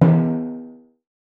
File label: music timpani error